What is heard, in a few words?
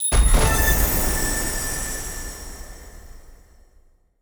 large Win; OceansGold; netent